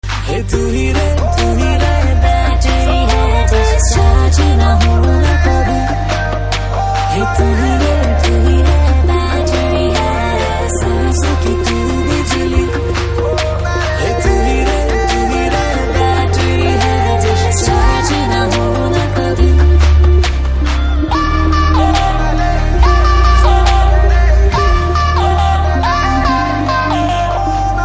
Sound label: BG Tone